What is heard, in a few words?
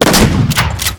shotgun shot